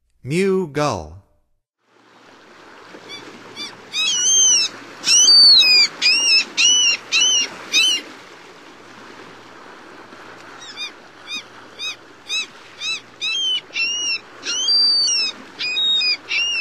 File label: Mew Gull; North America; Stokes